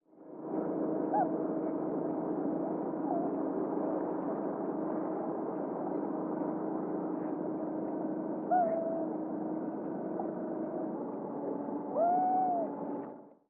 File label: loon sound hoot